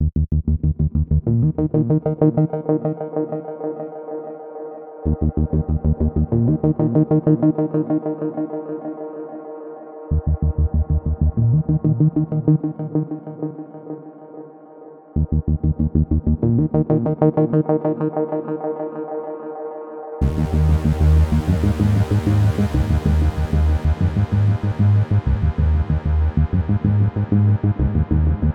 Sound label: Ambiance; Synapse